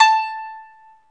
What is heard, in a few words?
ETH KANUN, Eth Plucks, Saz & Kanun; Club-50 - Foundations Roland